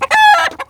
chicken death, creatures; Counter Strike Model Pack; CS:GO

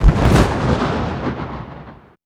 city battle; Half Life Model Pack; Half Life 2; ambient levels streetwar

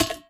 vent step; headcrab classic, scripted; creatures

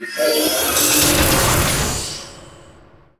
trust drop linear; Portal 2

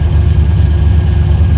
test engine; Half Life Alyx

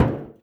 impact, metal barrel

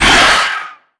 pain, antlion; creatures; vox

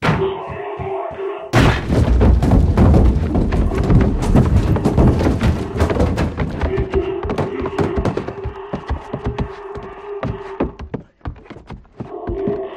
distant apartment fight; combine

